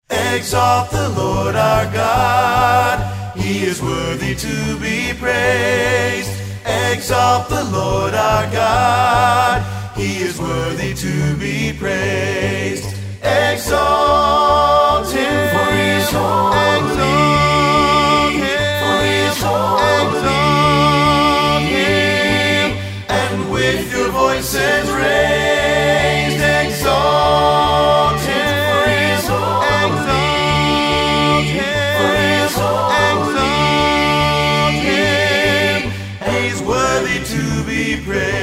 Acappella; you can still make music with your mouth

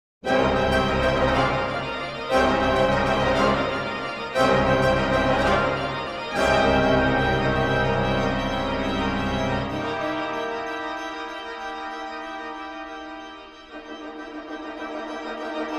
brass beginning

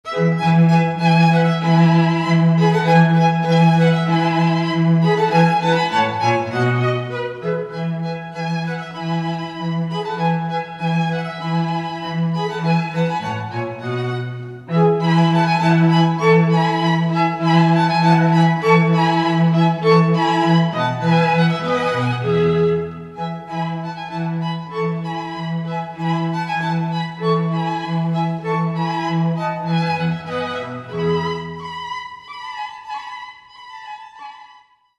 string quartet

